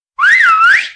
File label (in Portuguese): Assobio